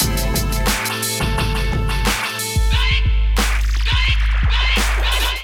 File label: Kit groove 88 bpm, Drums; MIDI Clips; Full Lotus; Ableton; Chop and Swing